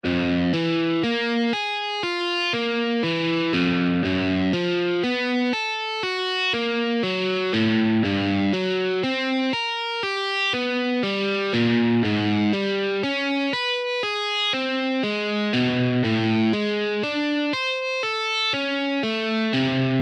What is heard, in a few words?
alternate picking exercises